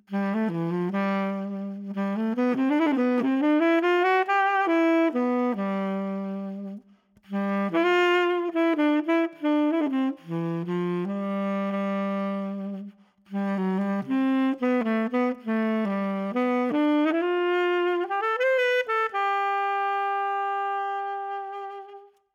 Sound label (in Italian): TENORE COMPATTO; timbri